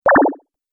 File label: Laser Switch Button